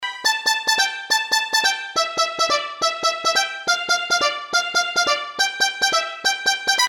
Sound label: pizz strings; Short, sharp strings.